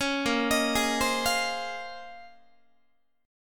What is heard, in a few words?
A sharp Minor 11th A sharp down Guitar, chord; arpeggiated; JGuitar